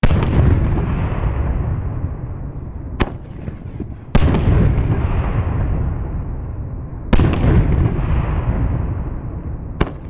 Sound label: Amb Destruction; Red Orchestra Ostfront 41-45